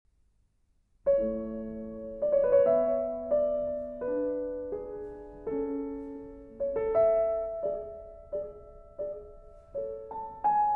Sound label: Piano Sonata in D Major, Adagio; No. 18; K. 576 Hunt